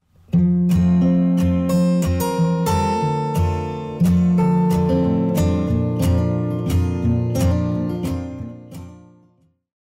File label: bgm